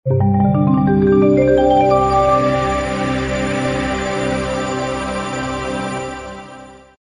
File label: logo light sprite